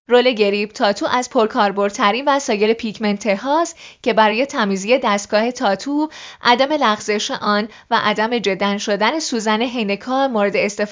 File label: roll